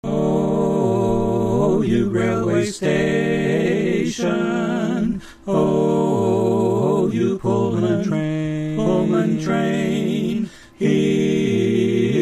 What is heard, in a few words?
Lead